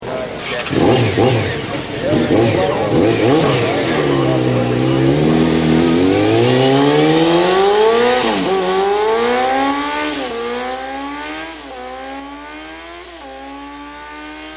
Honda CBR600F3, motoskanas

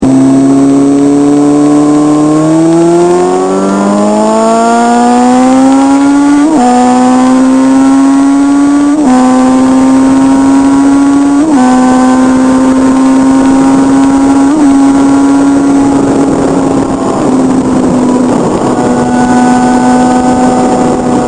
Suzuki Bandit 600

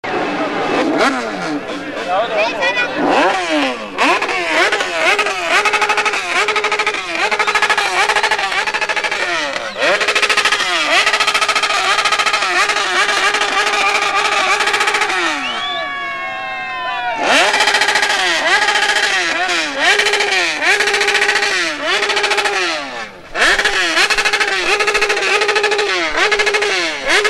Yamaha R1 no pipe

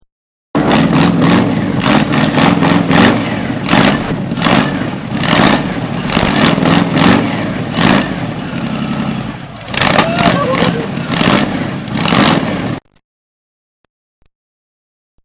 big brute v8, motoskanas

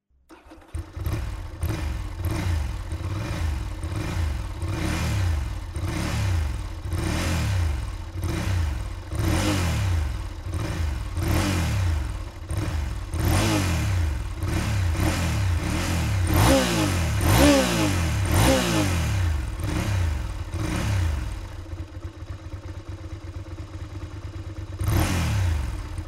ducati monster

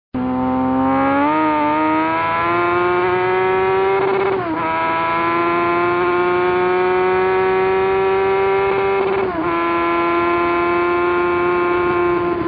Honda CBR900RR

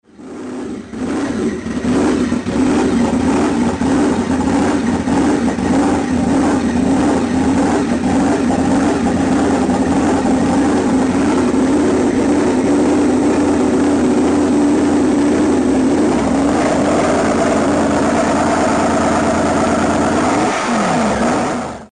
Yamaha VMax, motoskanas

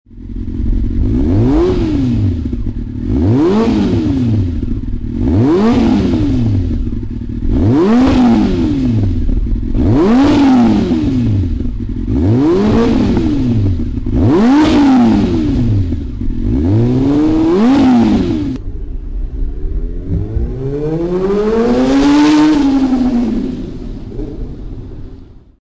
Yamaha R6 Racing Pipe; 2001; Two Brothers

